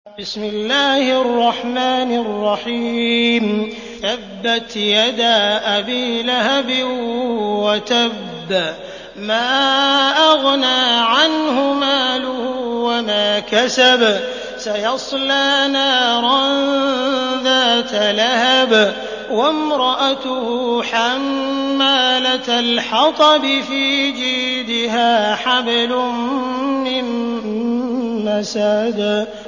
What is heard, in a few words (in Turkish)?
Tebbet, kuran; sudeysi sureler